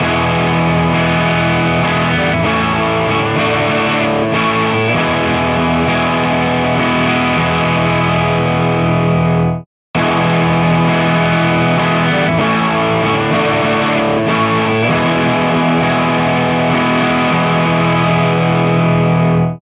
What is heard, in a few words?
Harsh and De Harsh